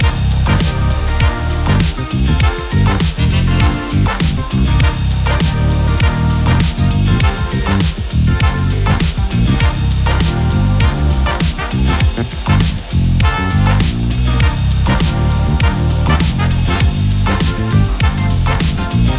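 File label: free spins music; netent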